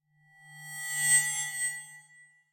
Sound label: Feedback Intrusive Tone - High And Fast; Hollywood Edge; sound designer's toolkit v2